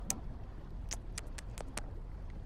clicking her tongue

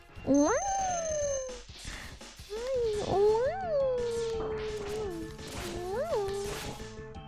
huonnnnnn

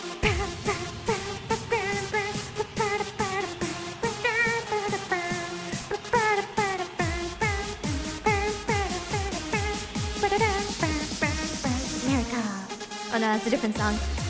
instru

